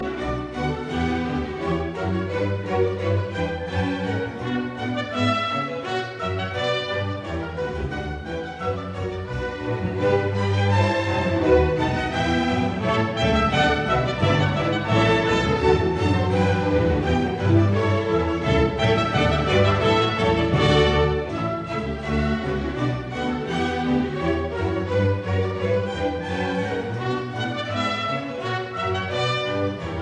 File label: Overture Suite in D major Gavotte; Bach; Otto Klemperer